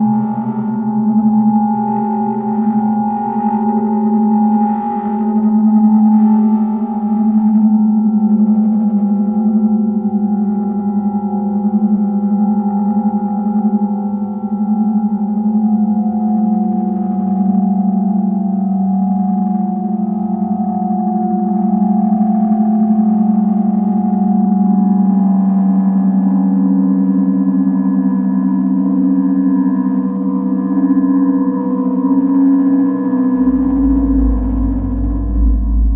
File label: ambience; Sonic Foundry; Sony Creative Software; Ambient Grooves